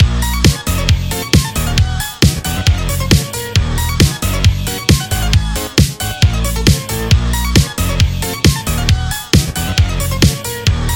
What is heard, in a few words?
Pause Menu; Friday Night Funkin Fightin for a Funky Night windows gamerip 2023